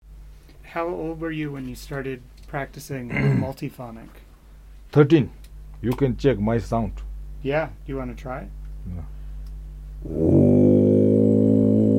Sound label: Testing Multiphonic; chanting master